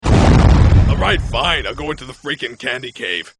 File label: explosion